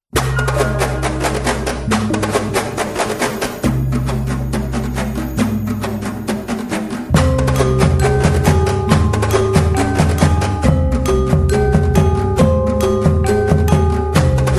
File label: kompang gamelan remix